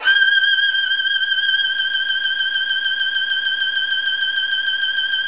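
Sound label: violin, Virtuoso Strings, SOLO VN, G5; ILIO; Kirk Hunter Virtuoso Strings VOL-1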